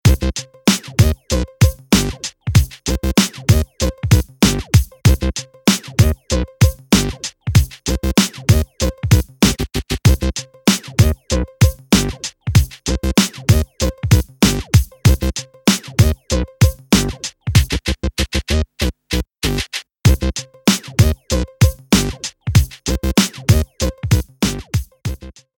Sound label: menu loop; retro detonation